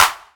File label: original clap sound c key, clap single hit tuned to the C note; single hits one shots